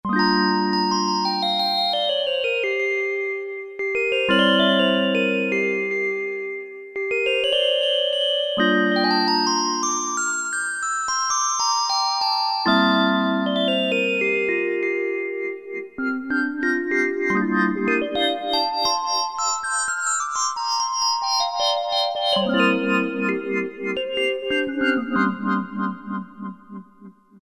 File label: Piano; Cheetah MS6